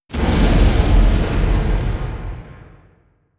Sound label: damage explode; jeredek; tardis